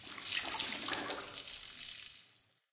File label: Peeing spill